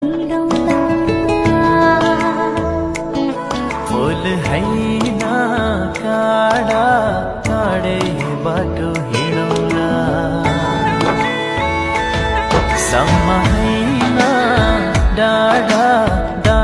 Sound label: nepali ringtone